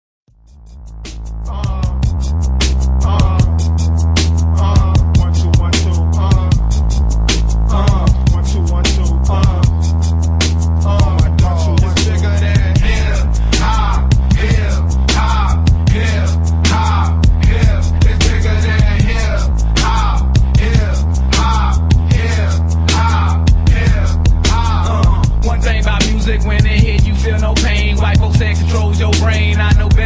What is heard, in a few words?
hip hop